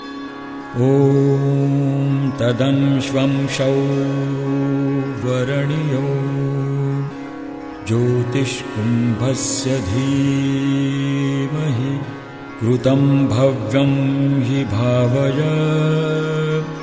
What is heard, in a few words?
Ganesh Sloka